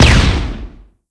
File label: Laser; blue archive